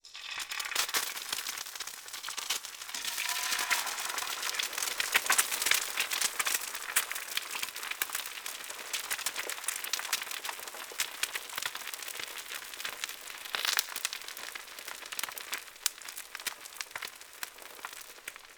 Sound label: omelete; anemoi